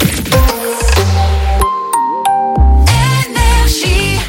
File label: Jingle; RAPID FIRE ID; NRJ; RAPID 2023